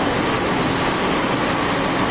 701 igbt mid tunnel; tetsuon

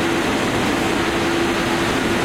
115 80 tunnel; tetsuon

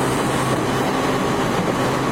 701 igbt mid j; tetsuon

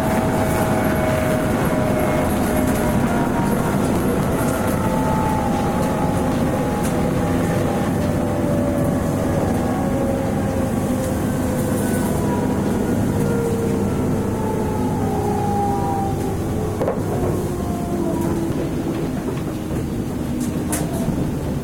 719 mid stop; tetsuon

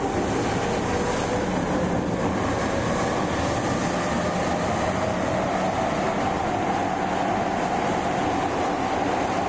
E231 sub slow middle; tetsuon

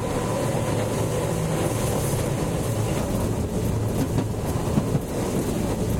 streetcar middle slow; tetsuon

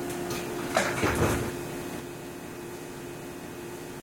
door self lock on; tetsuon

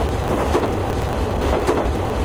80 bridge; tetsuon

